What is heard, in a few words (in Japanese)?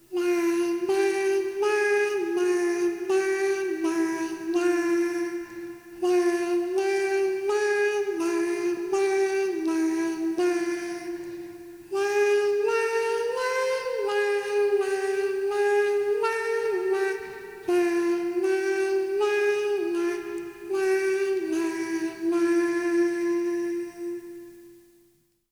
lalala